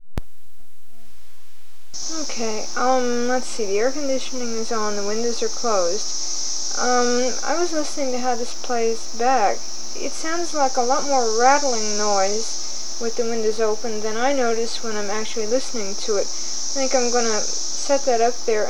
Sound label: cicadas